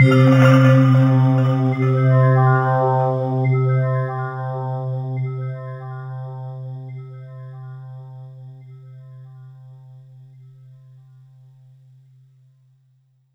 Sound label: Yamaha SY22 Crystals C3